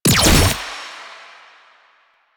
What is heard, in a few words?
Gunbrella Alt Mech, Weapons; Warframe; Tenno; Koumei